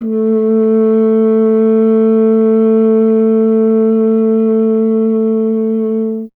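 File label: brass French horn; Miroslav Vitous; Solo Instruments VOL-2; Solo lnst. II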